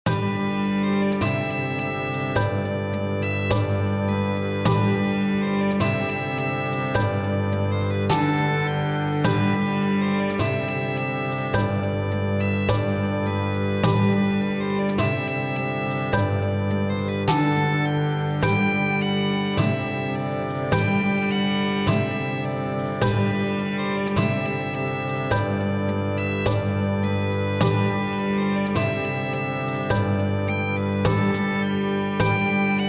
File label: Rogues March - bagpipes